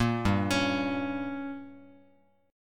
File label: G Diminished, Guitar; arpeggiated; JGuitar